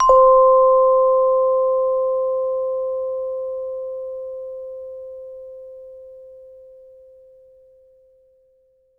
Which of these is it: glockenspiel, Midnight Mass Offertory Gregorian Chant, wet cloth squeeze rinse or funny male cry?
glockenspiel